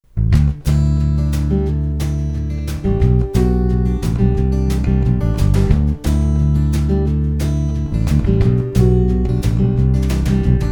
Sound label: Country Mix, basses